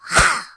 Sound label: berserk minion attack